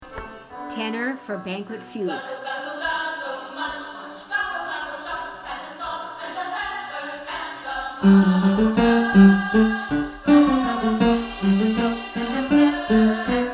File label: tenor